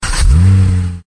汽车启动

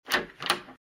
开门声

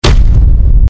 两人相撞

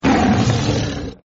BOSS吼叫; 武器音效

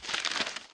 点击; 点击规则

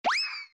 扔半桶水汽水牛皮糖

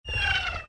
追踪导弹, 武器音效

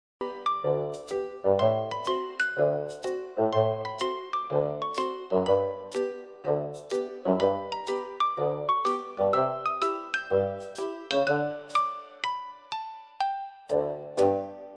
主页背景音

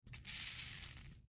撒盐声

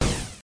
法杖; 武器音效

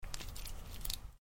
擦药声

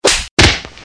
扇踢

